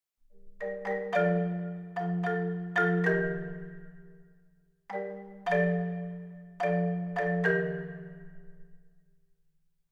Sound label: Nucleus Marimba